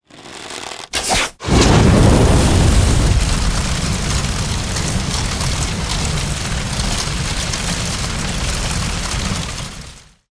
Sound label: Arrow Fire Burst